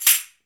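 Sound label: CARNIVAL SHAKER, Latin Shakers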